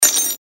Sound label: metal clang